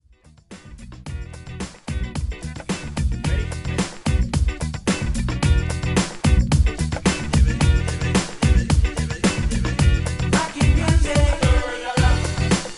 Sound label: FUNK REMIX